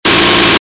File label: M163 cannon lite